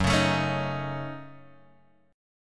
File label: F sharp Minor 6th, Guitar Open F; strummed; 6,8,9,8,6,x